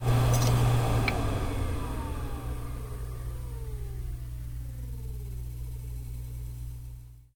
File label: anasthesic air Shut Down